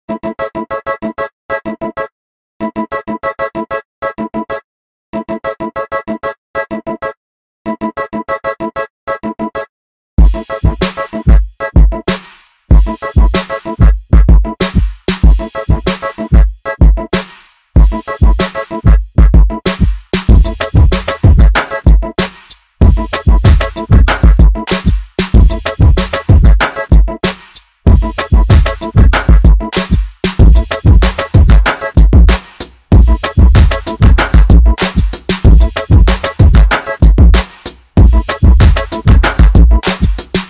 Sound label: Flip Phone Ringtone Remix